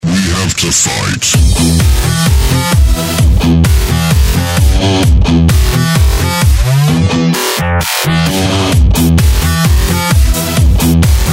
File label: Melody, RingTune